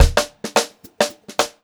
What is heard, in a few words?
FILL, Funk-Groove Drumloops; USB Soundscan vol.38; AKAI; 90 s Sample CDs